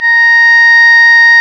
Synclav FM, WIND, A#; Big Fish Audio

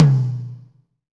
TOM TOM, Roland

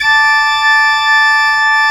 SCHARF D3, SOLO OR; AKAI S-Series CD-ROM Sound Library VOL-2

